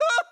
AHurt

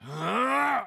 Attack Charge; Batman

